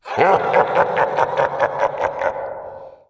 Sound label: haha; predator